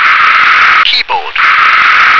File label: keyboard